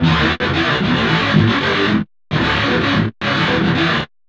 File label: GTR LOOPS